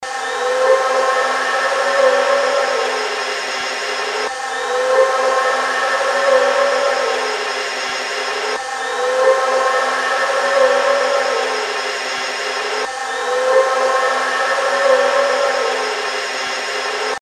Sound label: musical fx loop; atmosphaeren umwelt